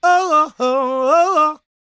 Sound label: GOSP MALE, Vocal Planet Gospel